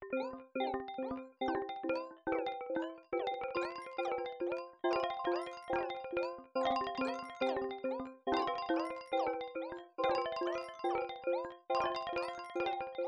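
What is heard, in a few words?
granular marimba C major; accents; bespoke - Floral v.2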